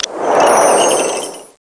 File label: click wind; NIV Bible, Billy Graham's Steps to Peace with God, WWJD Screensaver